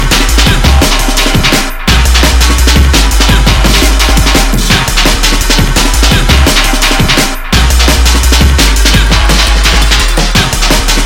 drum bad

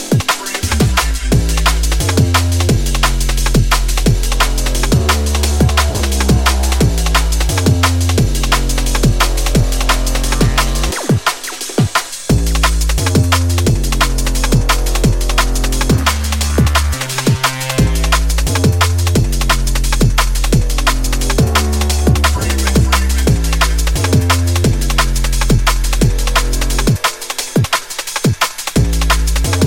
whiney dub

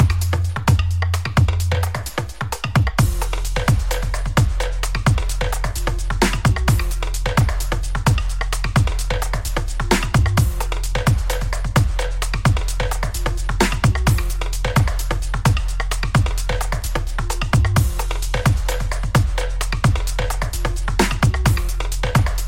taiko stick

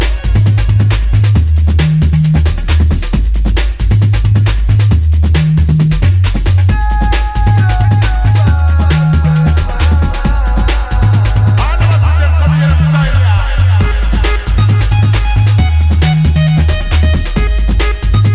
breakbeat

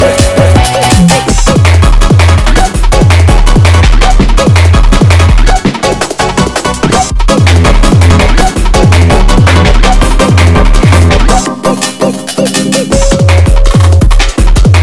riff one

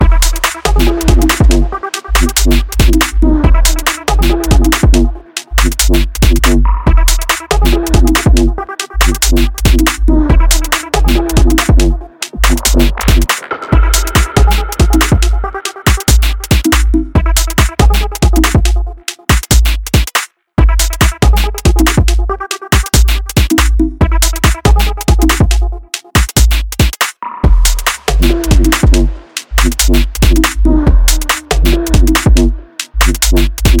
Afro Bass

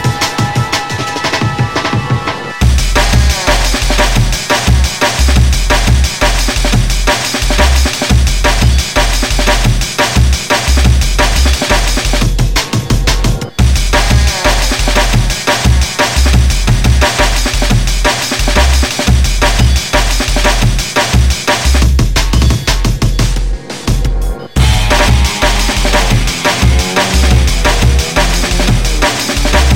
guitar

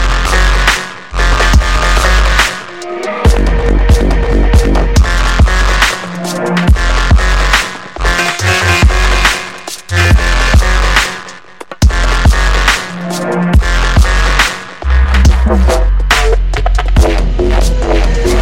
taiko pocket

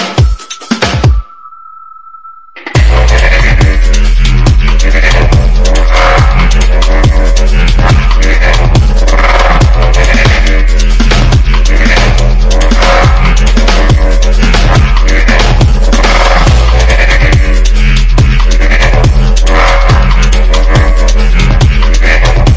taiko bloom

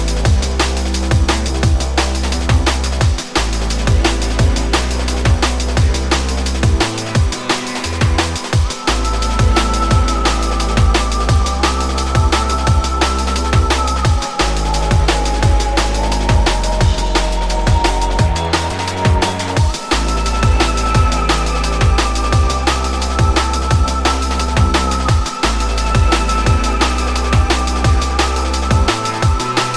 arp end

